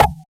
Percussive

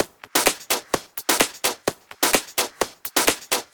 Loop, 128 BPM

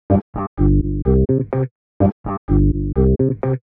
126 G C BASS, Funky Underground bass loops; Loopmasters; Funky Underground Vol 2

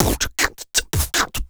Human Beat Box, Drum & Bass; Loopmasters

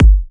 Bassdrum

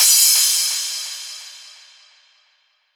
Crash, Cymbals

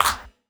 Clap, Dubstep One-Shot Drums; Prime Loops; Dubstep Illusions

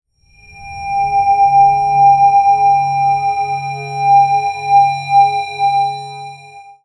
WIERD SKY G2, dubstep; Loopmasters; Jack Sparrow; Deep Dubstep Progressions

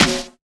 Claps & Snares

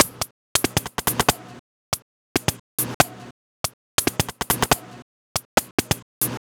140 FX LOOPS

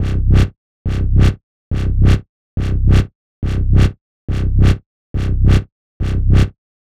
140 C BASSLINE, Dubstep; Loopmasters; The New Sound Of Dubstep; BASS LOOPS

